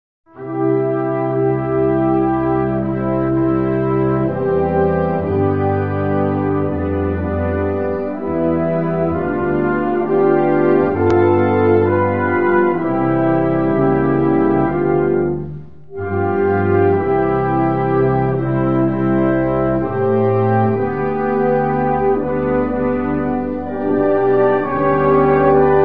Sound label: America the Beautiful (Instrumental); July4